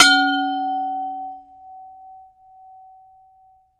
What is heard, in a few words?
Impact Vari Metal, crashes